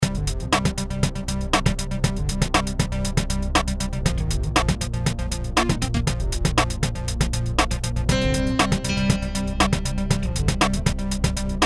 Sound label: Network Looping